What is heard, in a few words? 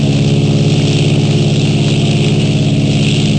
cessna 182, Model; FMS